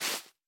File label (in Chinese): 苔藓踩踏声